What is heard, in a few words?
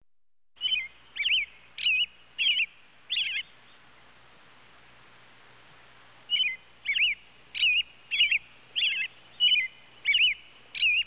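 robin birdsong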